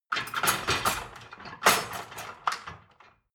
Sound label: dishwasher cutlery basket replace